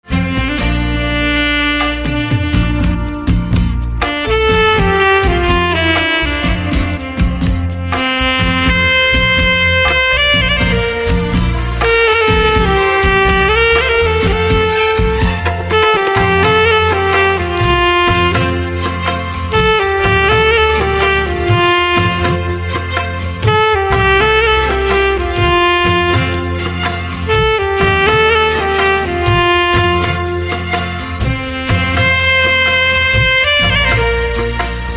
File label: Saxophone